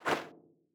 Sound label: jump; pygame